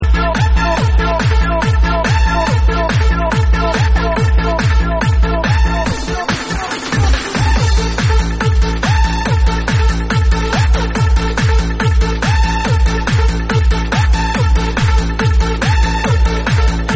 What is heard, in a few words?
TECHNO